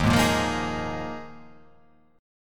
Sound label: D sharp Suspended 2nd Suspended 4th D sharp